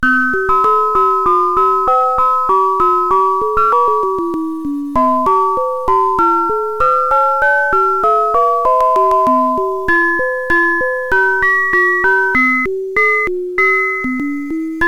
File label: sine; mymelo